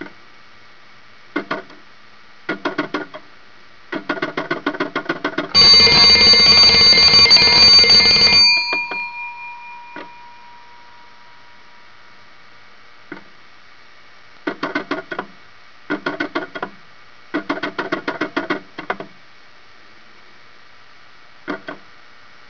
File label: ring, telephone